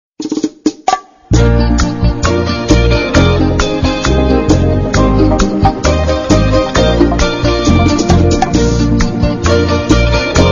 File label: bachata, ringtones